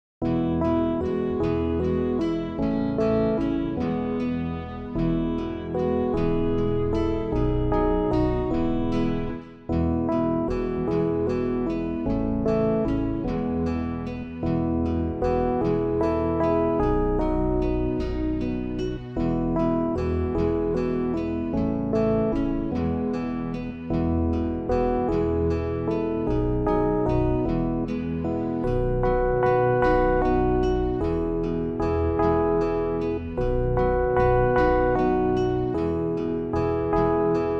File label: backing; New Hope Music